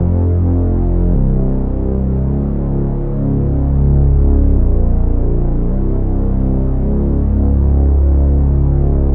Synth Loop Fm 105BPM, Bass; Loops; UMA

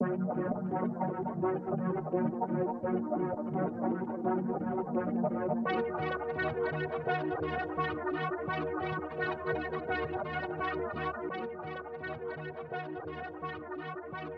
170 BPM D# Min Texture Layer; Loop Stems; Cymatics; 2022 Melody Collection Platinum Expansion - Pt 1; Doomed

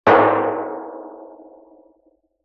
Oil Drum Hit Soft Sust; Vintage Cartoon; Drums & Percussion

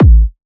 Techno, Kick; Techno Sounds 2024

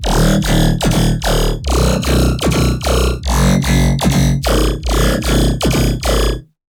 Dirty Bass D# 150, Bass Hits; One-Shots; Nucleus; Ghosthack - Color Bass Essentials

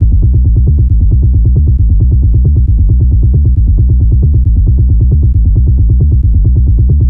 Bass Loop D 135, Techno; Ghosthack; AC2024 - Day 1 - Techno Sounds 2025

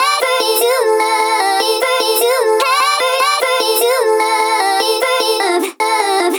Vocal Chop Loop 150 F; Vocal Loops; Ghosthack Advent Calendar 2018 Day 9

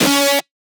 synth screech oneshot D# dry; Synth Hits; GhostHack

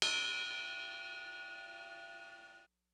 Diffuse Drum Loop 85 BPM Ride, Lofi; Cymatics; ANALOG EVOLUTION Beta

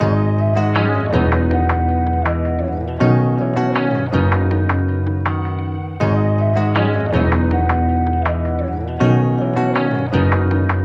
160 BPM G# Min, Guitar Loops; In Too Deep; Cymatics; Journey Guitar Loops

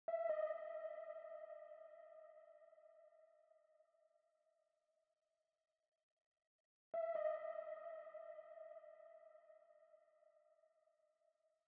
140 BPM D# Min Pluck, UK Garage; Melodies; Loop Stems; Cymatics; GHOST (Beta Pack); Speaker

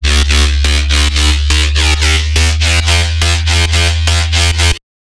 Bassline, Dubstep and Trap

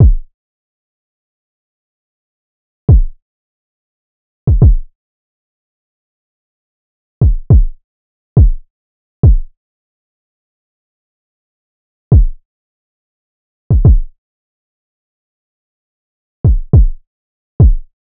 Drum Loop 104 BPM Kick, RnB; Affection; Cymatics; ANALOG EVOLUTION Beta

